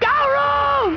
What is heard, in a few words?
scream